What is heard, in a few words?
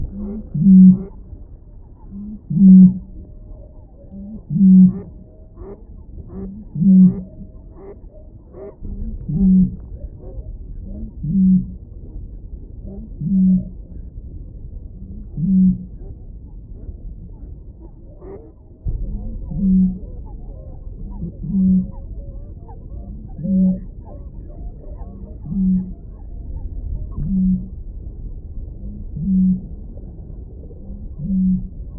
Eurasian Bittern Botaurus stellaris call Vallee de Baux France